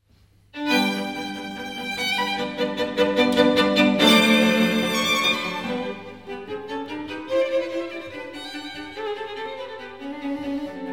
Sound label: Quartet in G, String Quartet; Haydn; Op. 54